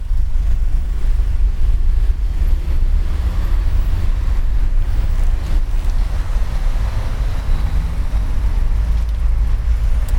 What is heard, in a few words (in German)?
aufm fahrrad, Fieldrecordings; kuehlgeraete + verkehr; 2006 - 2007 kuehlgeraet